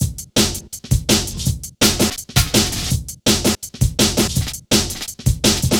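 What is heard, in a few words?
hard steps, drum loop, 165.5; Hard Stepz A; Jungle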